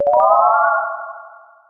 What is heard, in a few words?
hypnosis